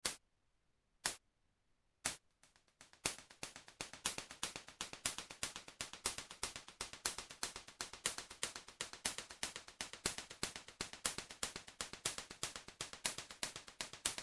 multitap; native instruments absynth 4

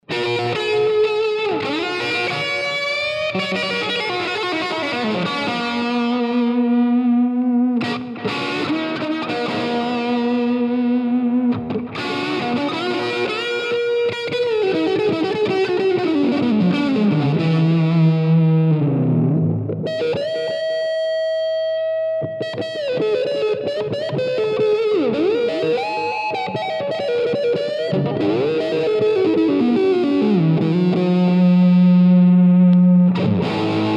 crunch tone crunch tape sg sim analog; echopark; line6; tonecore; simulanalog

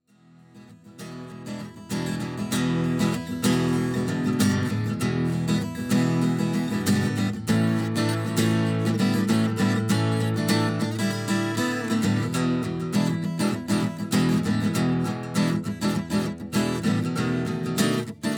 takamine; digidesign digi003

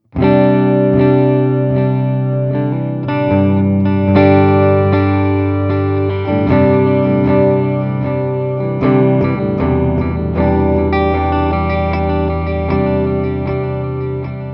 delay

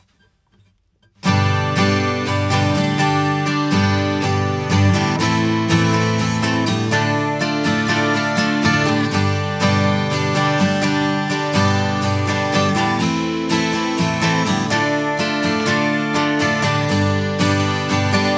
comp eq; Neve 8801 avec compresseur et EQ; guitare; AMS-Neve

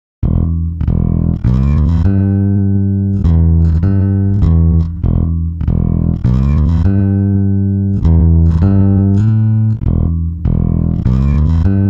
bass; usb uvi workstation; timestretch

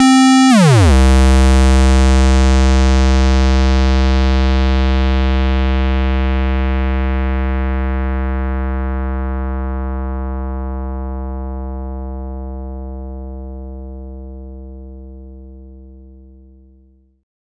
glide square; arturia; MiniMoog

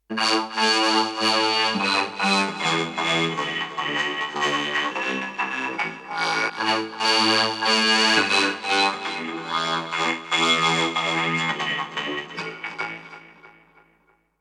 vocodizer; plugiator

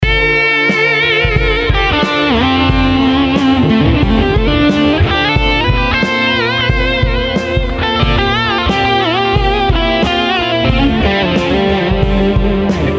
long delay solo; fxstory delay

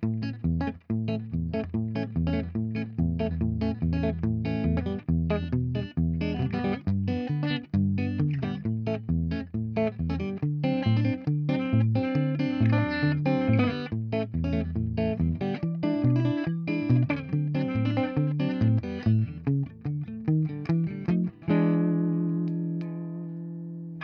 clean; fender classic player

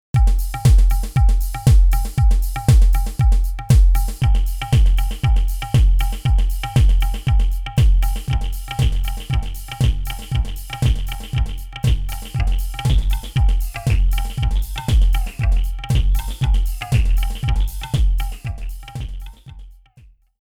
delayed filter; fabfilter volcano2

